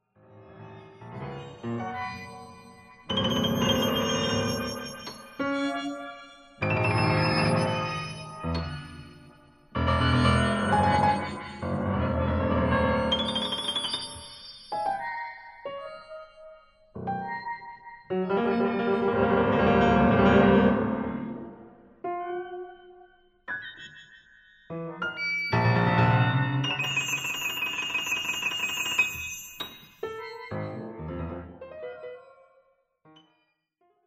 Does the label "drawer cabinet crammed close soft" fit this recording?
No